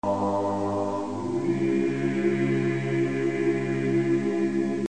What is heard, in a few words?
egg defused